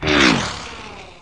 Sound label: bullet L to R slomo, near miss; weapons